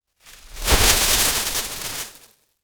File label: glass shatter debris